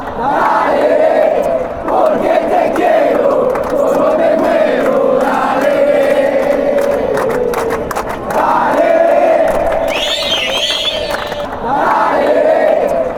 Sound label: chant, colombia; atlctico nacional